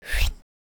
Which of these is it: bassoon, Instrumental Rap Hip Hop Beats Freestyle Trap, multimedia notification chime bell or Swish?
Swish